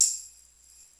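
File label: Tambourine, Roland JD-990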